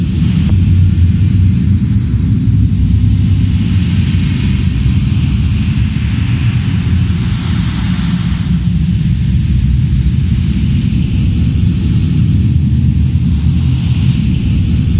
Fire Large; Barotrauma; Subsurface

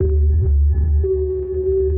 sonar Ping Suit Far Shuttle Directional; Barotrauma; Real Sonar

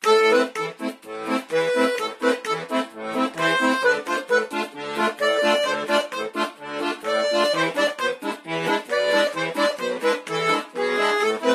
accordion; Barotrauma; Epic accordion

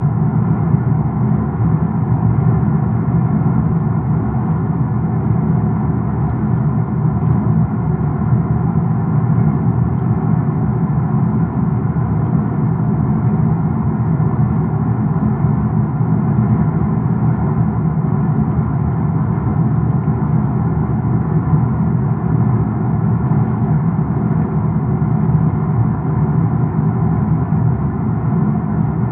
Water Ambience Moving; Barotrauma; Room FX

